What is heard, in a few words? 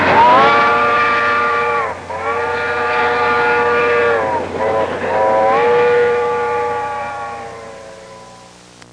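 train whistle; Sound Library 2000; Wayzata Technology; Transport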